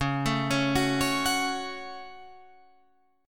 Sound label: Db Suspended 4th Sharp 5th Guitar Open F; arpeggiated